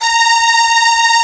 Strings C3

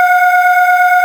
Solo Vox G3

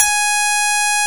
Strat C4

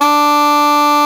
Hard Alto F#2

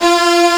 Strings G2

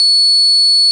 Jazz Guitar E6

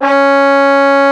French Horns Eb2

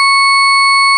Octave Wave E4

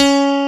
CP70 D#2

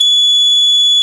Doo C6

